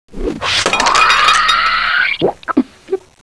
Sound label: reload, weapons; vendetta